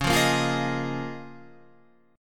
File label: C sharp 6th Add 9th C sharp down Guitar Standard; strummed; C#6add9; JGuitar